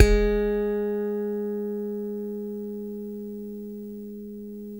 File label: 6 STR FUNK; Spectrasonics; Bass Legends